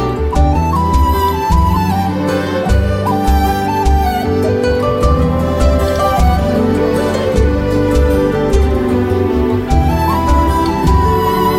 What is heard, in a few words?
BGM